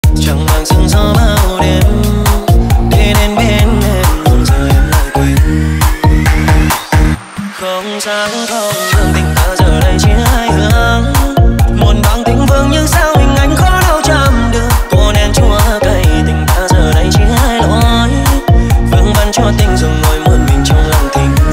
remix

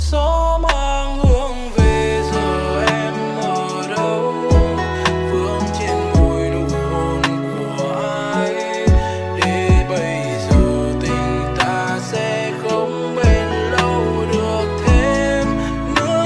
Lofi

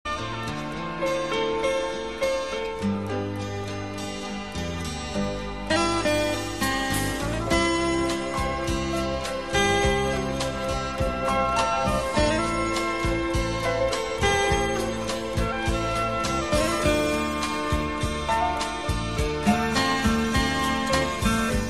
Piano

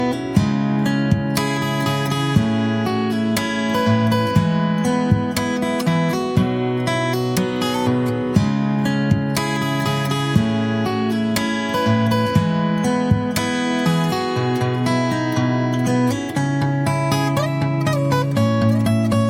guitar cover

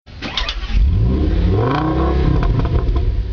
audi s8 start engine; nhac chuong; khong loi

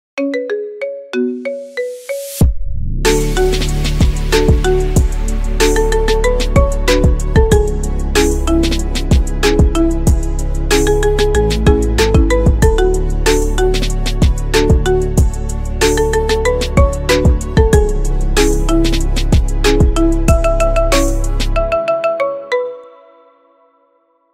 marimba ringtone

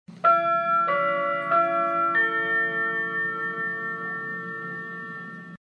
haneda airport chime; nhac chuong

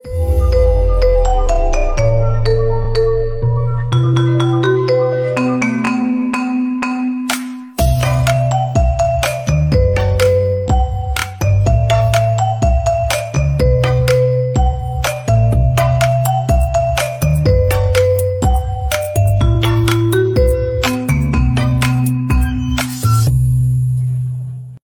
marimba remix

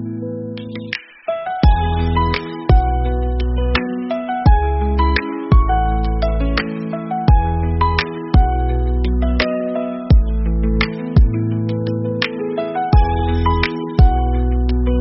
instrumental

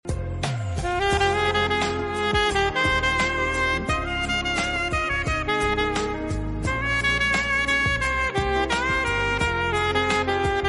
saxophone; da khuc saxophone; khong loi

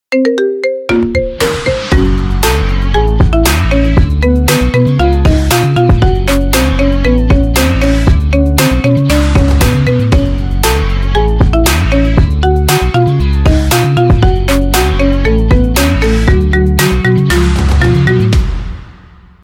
iphone marimba ringtone; nhac chuong; jujutsu kaisen

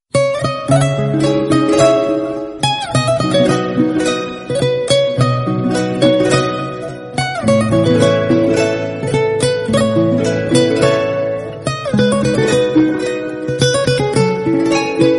sexiest romantic guitar ringtone; nhac chuong

